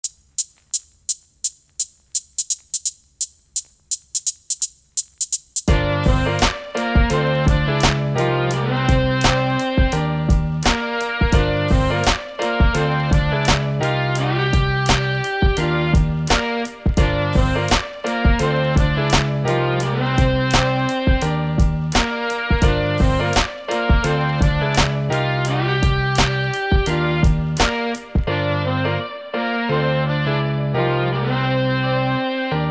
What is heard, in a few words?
casino type beat